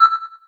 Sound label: pda zone; STALKER; IWP